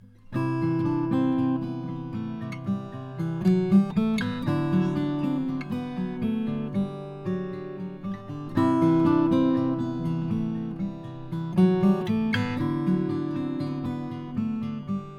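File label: bourgeois custom l dbo torrified adi, guitars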